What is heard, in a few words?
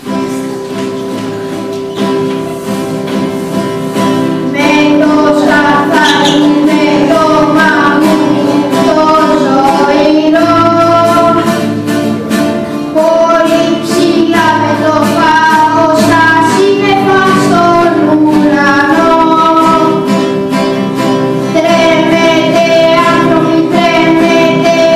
music